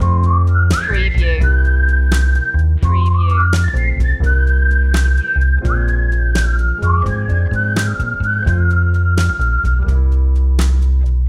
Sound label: nice and easy whistle loop